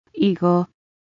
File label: eagle